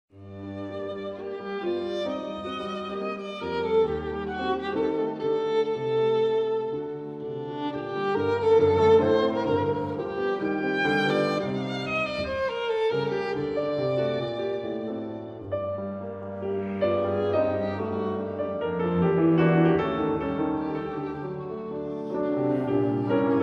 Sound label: Trio; Clara Schumann